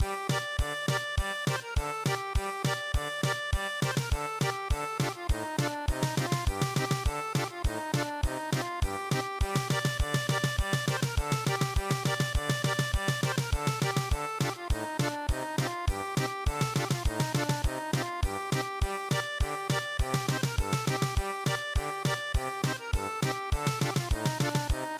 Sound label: wild field duck German Polka